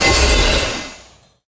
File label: Holy Smite